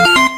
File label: notification; undertale; undernet